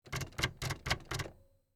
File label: typewriter space bar